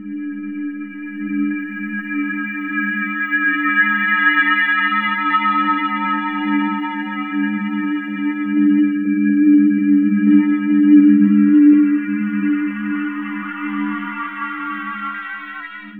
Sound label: Spectral Tones